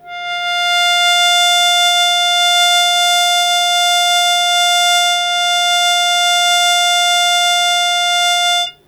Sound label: Long Sustain Aa F2, Harmonica; Zero G - Harmonica